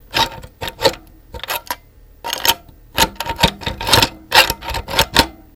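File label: Handle Bar On Then Off, scrapes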